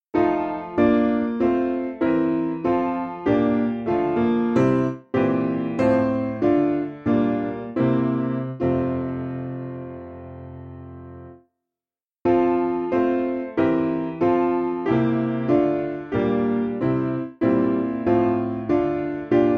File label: Piano